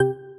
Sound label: Pluck; Mario